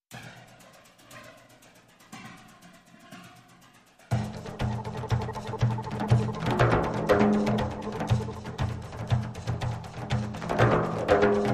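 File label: Small Percussion Only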